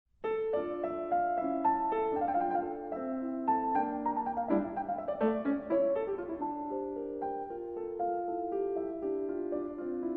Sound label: Keyboard Sonata In D Minor